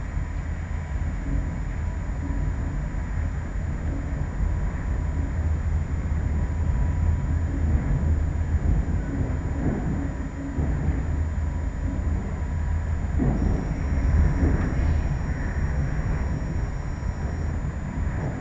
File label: Flange, Train; H-START Stadler KISS